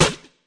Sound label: fx drum, Electro FX